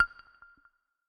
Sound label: Blip, notifications; Canonical Ubuntu Touch 16.04 OTA-5